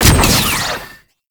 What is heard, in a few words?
Heat Gun Shot